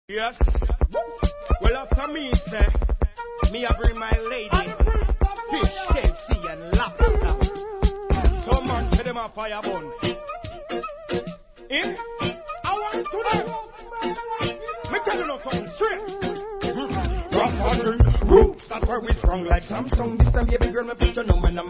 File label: double kick riddim